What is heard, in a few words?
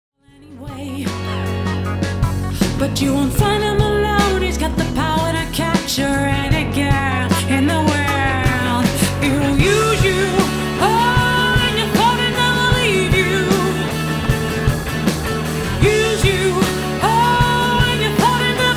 vox Stem Split